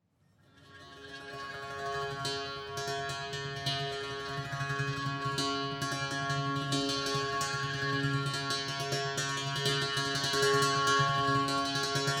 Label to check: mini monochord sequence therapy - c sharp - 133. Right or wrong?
Right